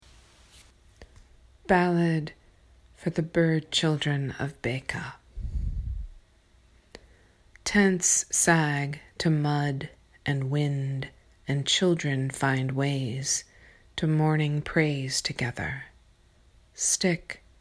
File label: ballad; bequaa